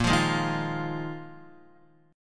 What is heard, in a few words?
A sharp 7th Suspended 4th down Guitar 4E 4A 5D 5G 5C 6F; A#7sus4; strummed